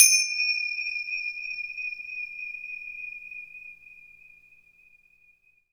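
finger cymbal, Synclavier Percussion, World & Orchestral; ILIO; Synclavier Percussion 2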